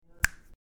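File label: finger snap once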